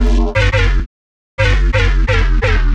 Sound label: Bass loops; neuro; Optiv & BTK